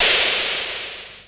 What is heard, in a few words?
Sci fi